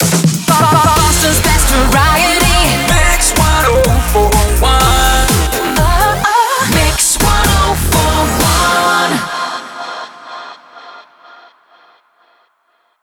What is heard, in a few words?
125bpm f mix main ID; WBMX 2017